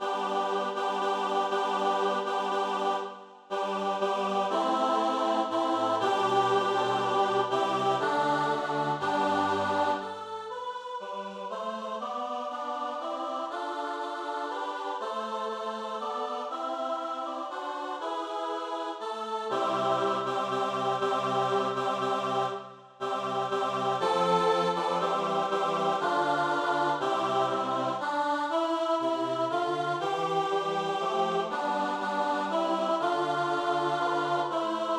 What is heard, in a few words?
Alto; Machet die Tore weit